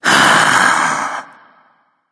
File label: sigh; smite; hebo